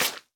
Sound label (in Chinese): 湿海绵 踩踏声